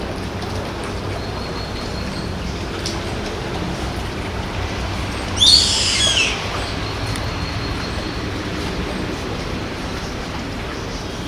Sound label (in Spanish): Aguilas Cabeza AMARILLA Cantos Gritos; Hogar V.M. Thoth Moises